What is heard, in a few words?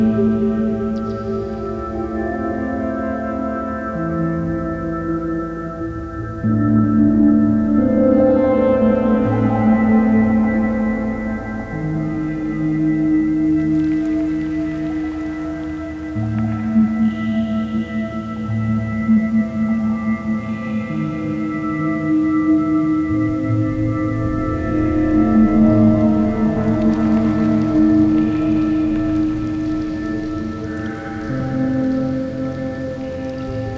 water caves; Underrail